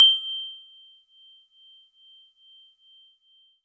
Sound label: Celeste DX100 G-1; Pristine Celeste